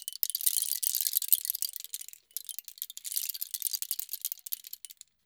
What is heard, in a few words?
ETHNO EXTRA 90; ethno2